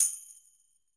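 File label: Tambourine A Hit; Latin Percussion; Drums; Drum Hits; Ableton; Factory Packs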